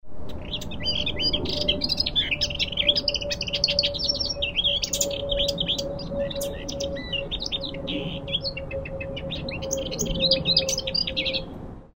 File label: lesser whitethroat; totteridge